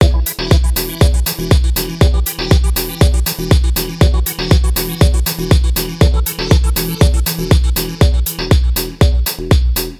120bpm hard with acid

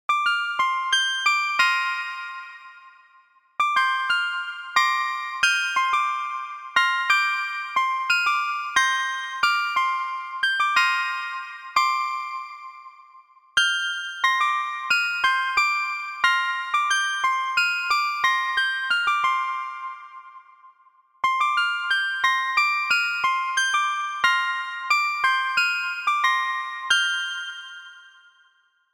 Oriental

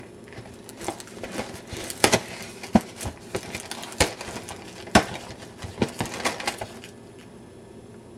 Opens Cereal Box

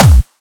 Hard Kick

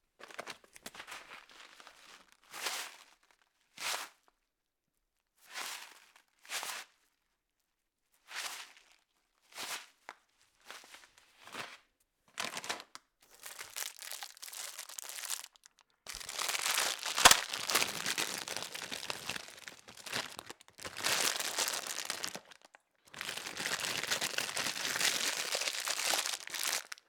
Plastic Packet Lentils Shake Open Close

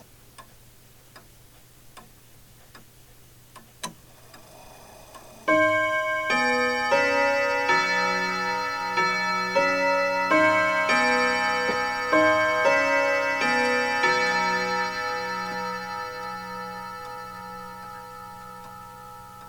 three quarter chime

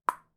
Ping Pong Ball Hit

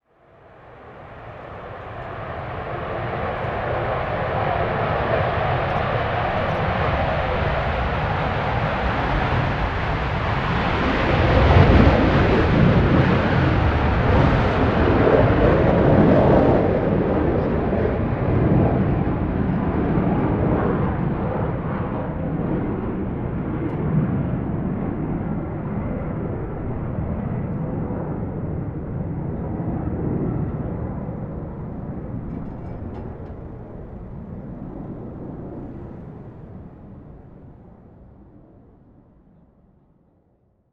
Euro Frighter Take Off

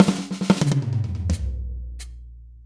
Drum Fill

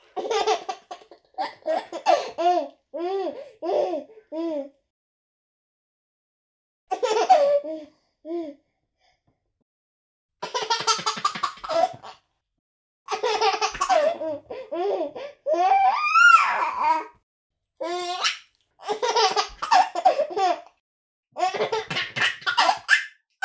Baby Laughing Around 6 Months Old

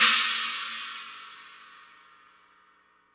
China China High High Vol

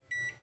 Button

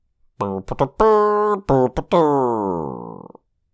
Fail Music; Indiana Jones

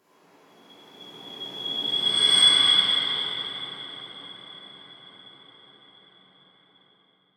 Small Swoosh

